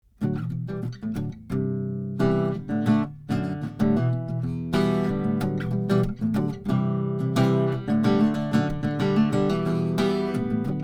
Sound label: Ocean Harmonic; Cascade VinJet